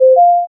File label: notification; meshastic